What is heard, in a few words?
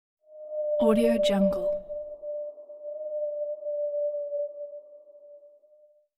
jungle bird calls